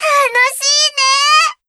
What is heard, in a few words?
combo break; osu